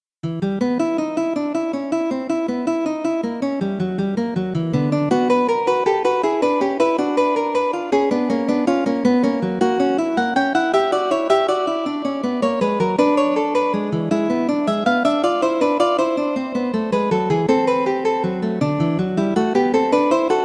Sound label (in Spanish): fuga; bach johann sebastian; clave bien temperado 2